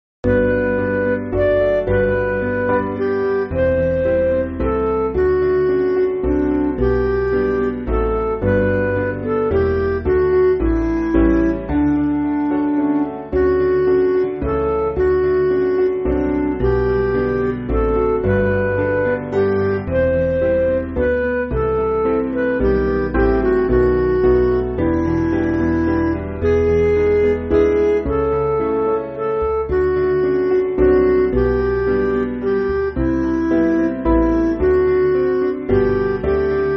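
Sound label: Midi, Music Backing Files for Churches; Christ Is Made; Westminster Abbey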